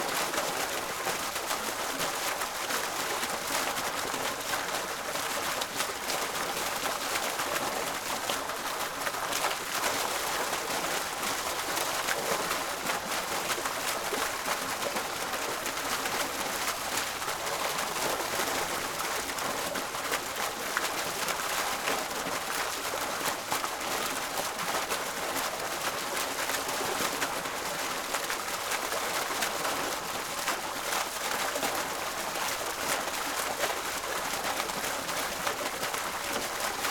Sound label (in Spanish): Parque agua; ParqueTierno